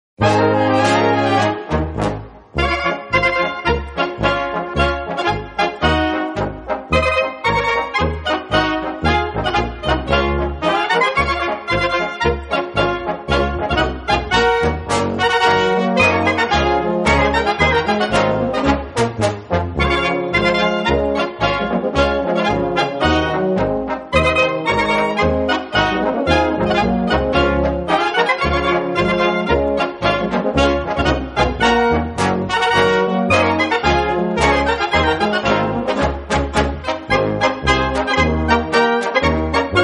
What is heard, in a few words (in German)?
Bayrisch Böhmisch, Kapelle